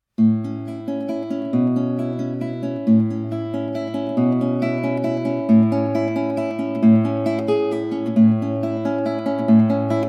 instrumental; Cache-cache

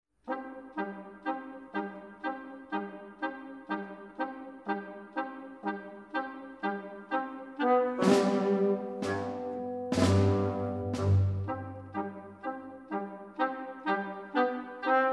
instrumental; Chanson des bucherons

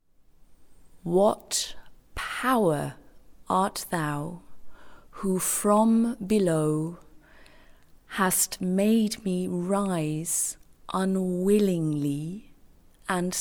texte lent; cold song; chantons baroque

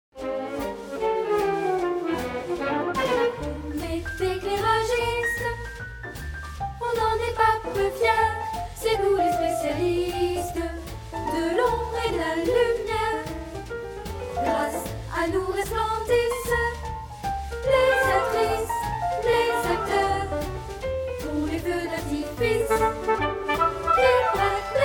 chant

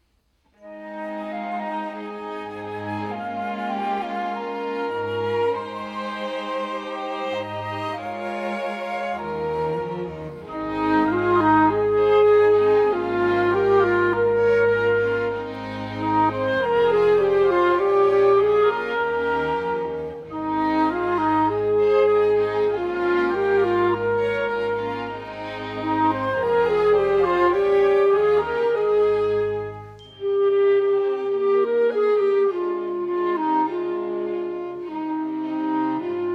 clarinette; petit prince

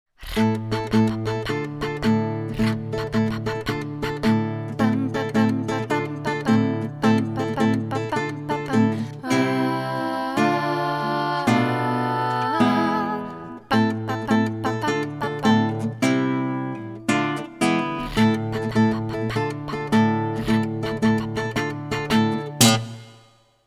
accompagnement seul

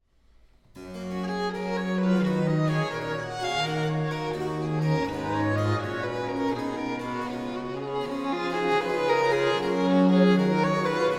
orchestre; Jesus bleibet meine Freude

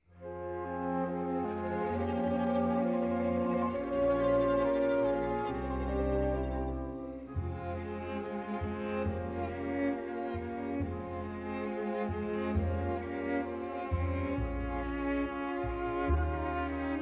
instrumental

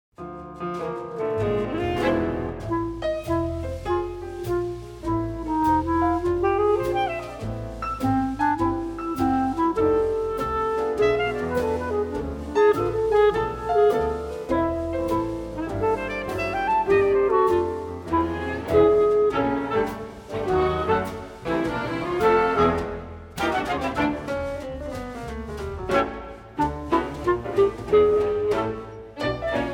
clarinette

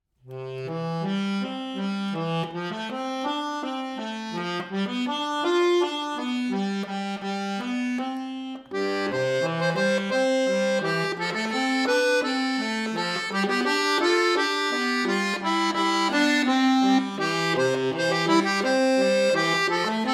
instrumentale; Cinq vilaines fées

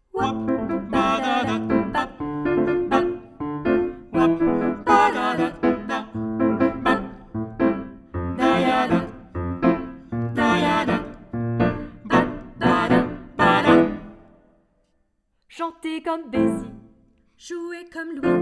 Tutti; Solstices

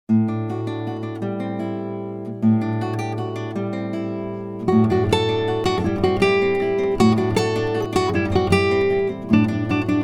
accompagnement guide

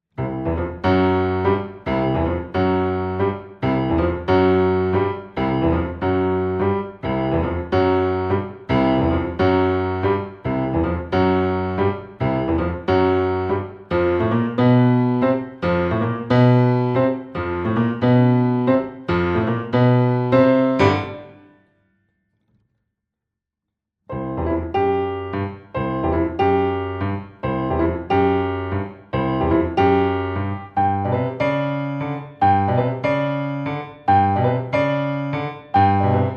instrumentale; amitie

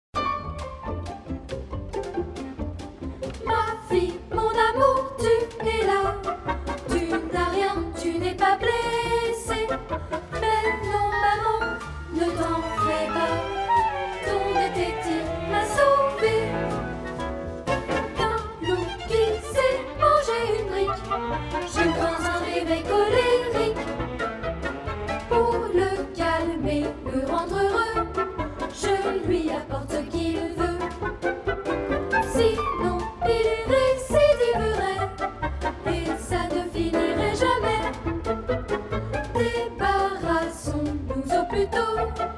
chant

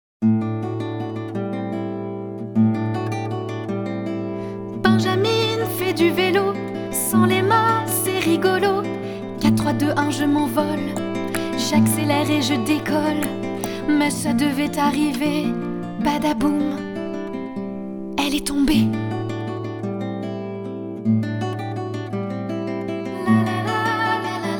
comptine

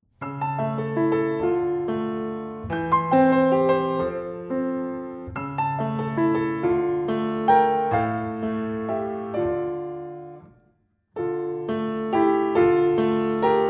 le myosotis instrumentale

